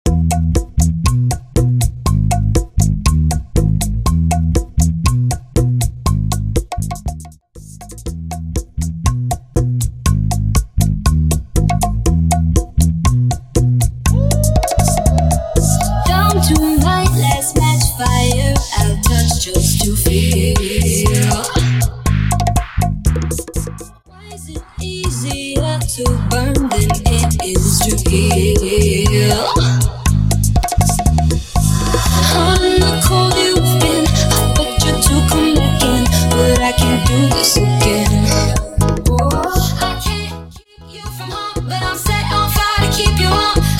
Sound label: Bachata Project 120 BPM; bootleg bachata remix